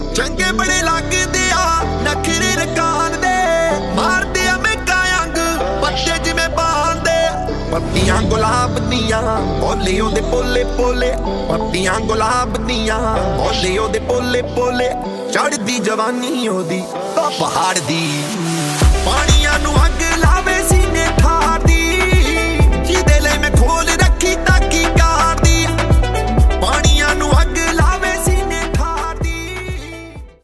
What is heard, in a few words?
Ringtone